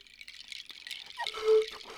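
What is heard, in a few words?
Music Village Calm Near; from dust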